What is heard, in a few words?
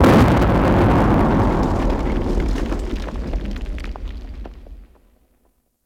Asteroid Mining Explosions; Ambience; Warframe; Grineer